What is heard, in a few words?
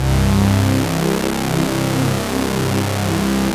SYN, Analog; Sound & Vision; Gigapack I CD 2